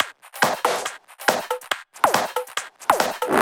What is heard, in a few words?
Loops 140 BPM Hihat Percussion Loops